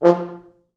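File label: French Horns staccato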